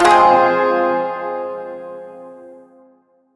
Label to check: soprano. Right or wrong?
Wrong